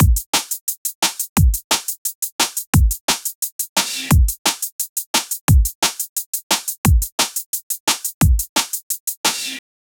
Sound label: drum loop in drum